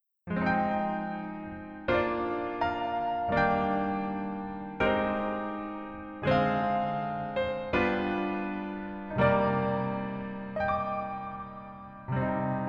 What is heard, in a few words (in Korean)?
Piano ver, 멜로디MR, -1키; 낭만닥터 김사부